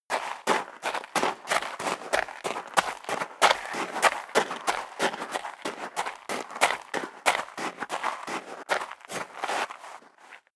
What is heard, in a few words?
step leather shoes gravel light run sequence; schritte